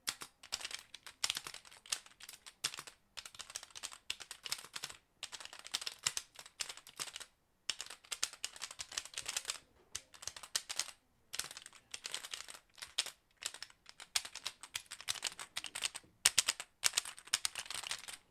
mechanical keyboard tapping, Typing very fast on a plastic mechanical keyboard in the living room